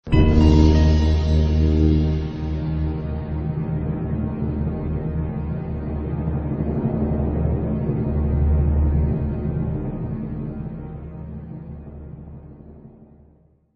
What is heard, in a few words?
TENSION, game effect; WWTBAM